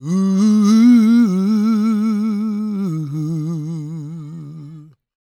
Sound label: GOSP MALE, SOLO; Vocal Planet Vol1 Gospel